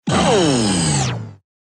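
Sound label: power outage, power drop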